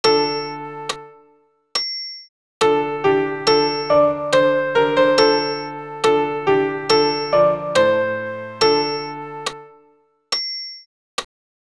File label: Gloria Amen sop pian acc